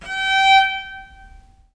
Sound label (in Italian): G4, Historical Instruments, VIOLE MUT; USB Soundscan vol.23; AKAI